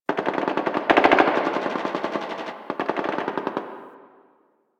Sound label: Distant Gunfire